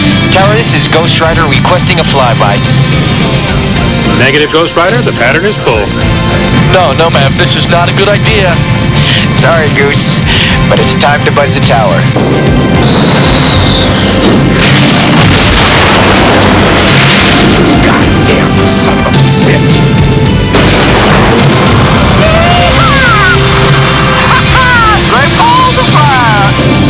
fly by

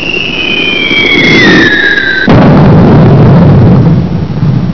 incoming

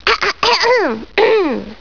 ahhem

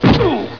big smack

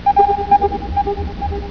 Bleeps

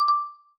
end video record

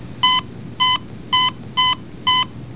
ekg

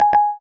jbl confirm

